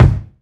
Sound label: dry kick drum one shot G sharp key